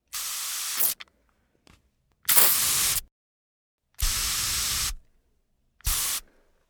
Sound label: spray